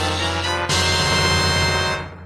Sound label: item get; zelda